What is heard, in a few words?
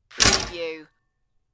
Toaster